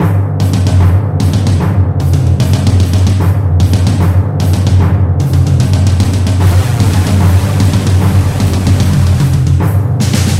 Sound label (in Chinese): 最终boss场景; blood city